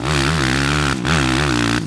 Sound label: high, Engine